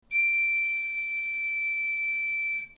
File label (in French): ré, galoubet; Note Ré - 4ème ligne; Gal ut